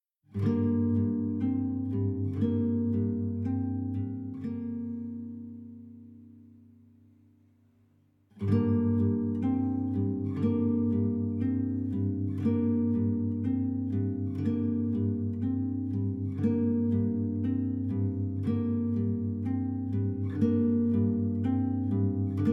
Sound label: Savannah Music